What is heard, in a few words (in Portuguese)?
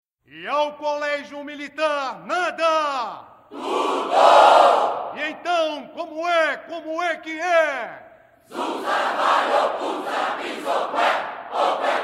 Grito de Guerra; Colegios Militares